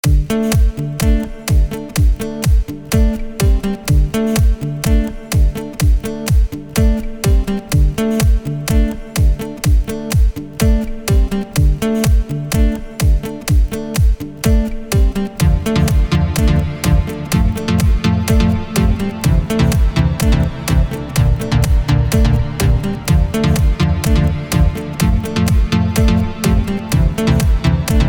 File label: Crazy guitar party